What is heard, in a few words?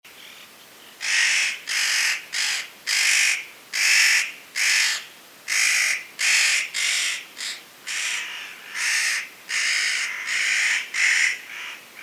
Spotted Nutcracker Nucifraga caryocatactes